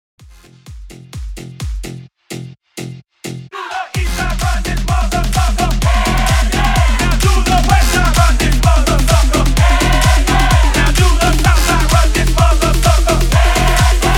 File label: TRANS UP 128 BPM